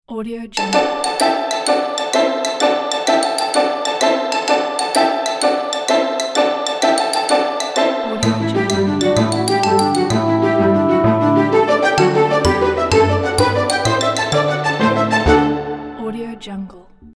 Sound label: orchestral logo